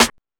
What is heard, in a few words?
Techno C, Drum Machines, EMU XL-7